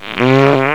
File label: fart